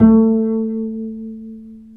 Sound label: Bass, Roland; Club-50 - Foundations Roland; Ac Bass